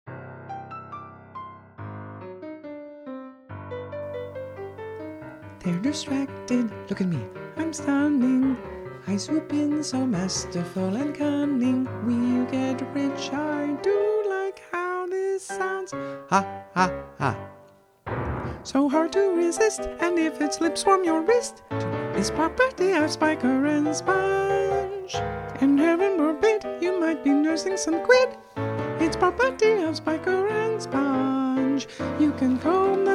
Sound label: audition vocal guide